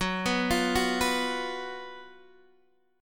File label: F sharp Major 7th Suspended 4th F sharp, Guitar Half Step Down